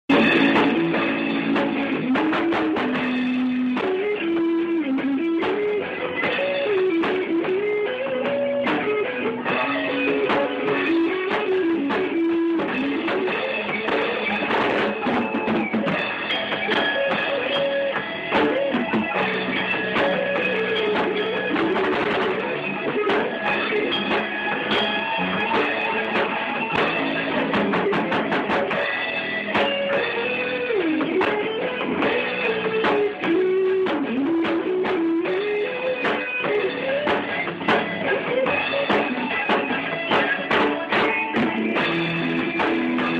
solo live banda

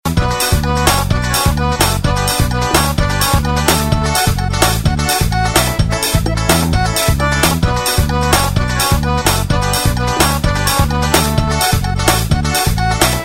forro

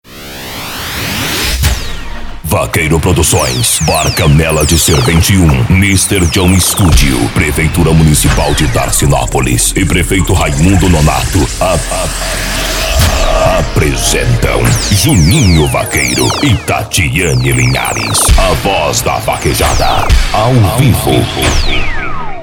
abertura show ao vivo; dancinopolis

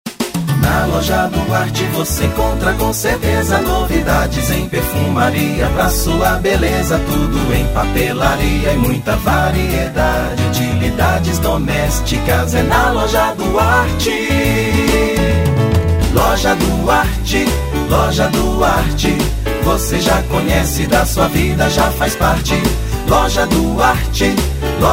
jingle; loja duarte

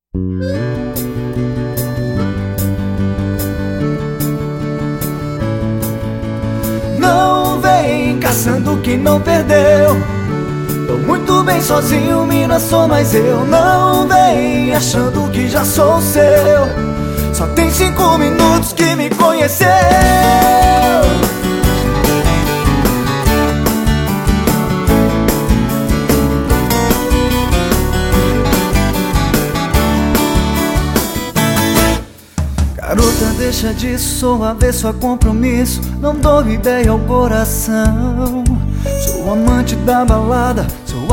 sertanejo

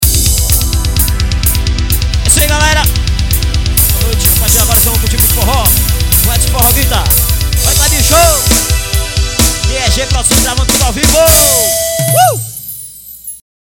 abertura

